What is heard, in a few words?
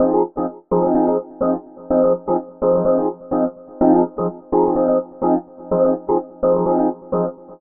126 F MUSIC; funky; Loopmasters; Funky Underground Vol 2